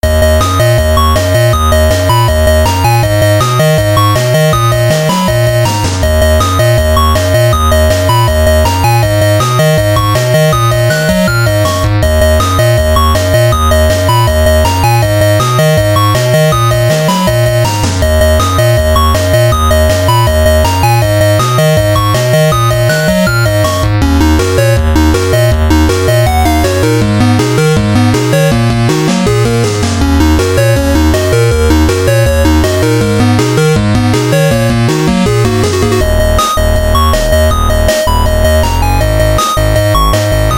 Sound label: boss slow; super stone legacy